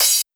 cym

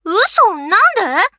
fail sound; osu

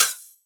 hihat

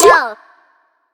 waterdrop

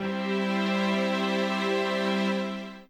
chord

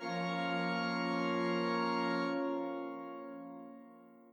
chord

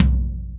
Low Tom

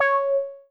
meow C sharp

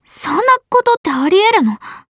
fail sound

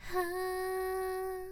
Choir; osu

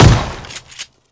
Shotgun; osu; Ratchet and Clank

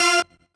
normal hit normal

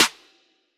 snare trap

